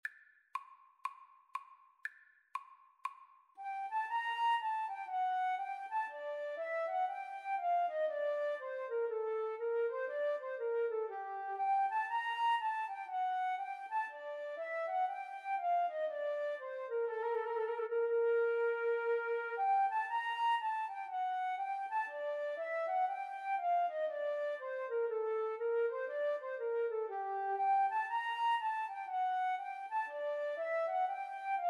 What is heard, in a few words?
bouree, Flute Clarinet Duet; bach